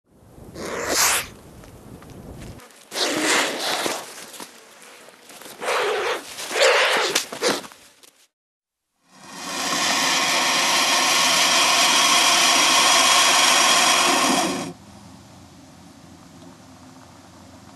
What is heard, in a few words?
Turkey Vulture